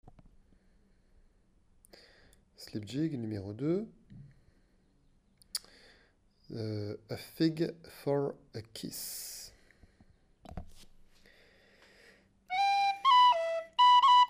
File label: Slip Jig